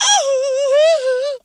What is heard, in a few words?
"oohoooayhoo" SUNG; ooh ahh